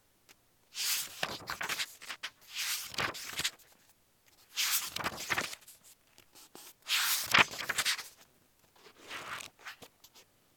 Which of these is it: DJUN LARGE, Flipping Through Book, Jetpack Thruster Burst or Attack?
Flipping Through Book